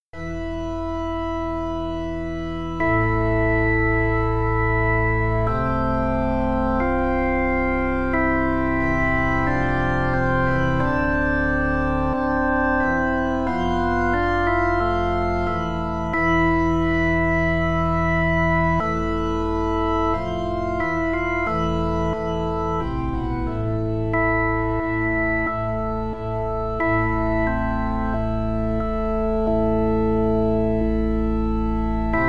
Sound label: trio kacha organ